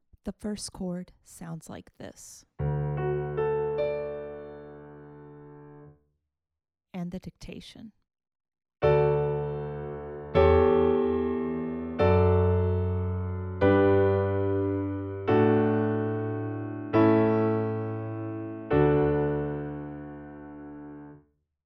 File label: harmonic dictation